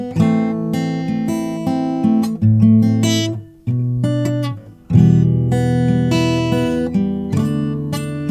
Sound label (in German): mic an laptop gitarre